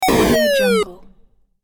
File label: hyper space arrive fail